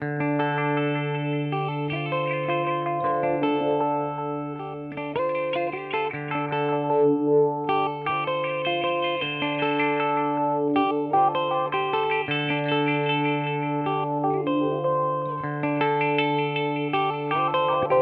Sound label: Boss HX Stomp Delay Sweep Echo